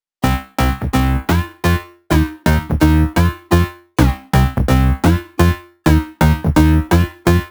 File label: 128BPM Melody; Vengeance Samples; VFH1 128BPM Big Tee Kit